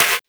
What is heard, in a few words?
Perc Gas Can; pitchglitch